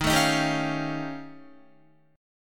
Eb 9th Flat 5th, Guitar; Eb9b5; strummed; 11,12,13,12,13,12; JGuitar

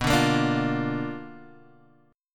B Minor Major 7th Flat 5th, chord; strummed; JGuitar

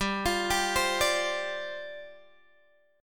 G 7th Suspended 4th G down Guitar Open G

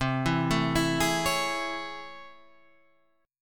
A Major 7th C; arpeggiated; JGuitar

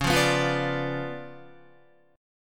Db 7th Flat 9th, Guitar; Db7b9 strummed; JGuitar

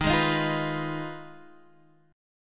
F Minor 7th Flat 5th Eb, guitar chord

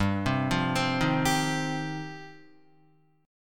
G 7th Suspended 4th Sharp 5th, Guitar; G7sus4#5 arpeggiated

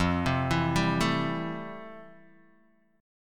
F Major 9th F, Guitar, Open D

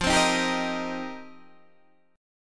F 7th Suspended 2nd Suspended 4th F Guitar Open D

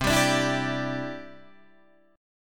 C Major Add 9th, Guitar Open D

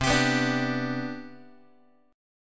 C Major 7th Suspended 2nd C down Guitar, chord; CM7sus2 strummed; x,8,10,9,6,6; JGuitar

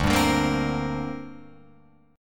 D 7th Suspended 4th Sharp 5th down Guitar Open F; D7sus4#5 strummed

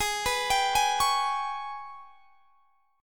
G sharp Minor Major 7th Double Flat 5th; arpeggiated; x,23,21,24,21,21; G#mM7bb5

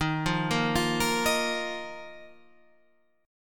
Eb Suspended 2nd, Guitar Standard; Ebsus2 arpeggiated; JGuitar

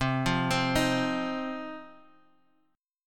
C Minor Double Flat 5th, chord

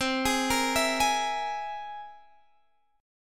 C Augmented 7th; arpeggiated

